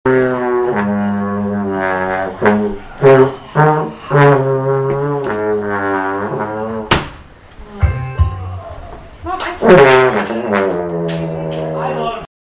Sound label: failed; itgmania